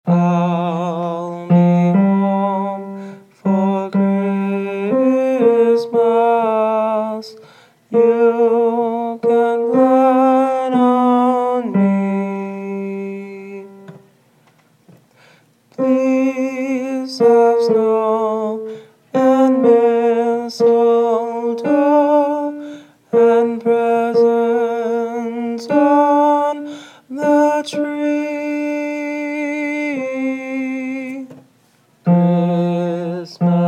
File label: tenor